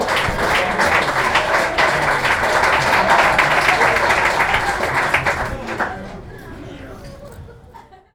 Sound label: crowds